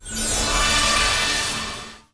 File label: random Symbol Transform; fairyhansel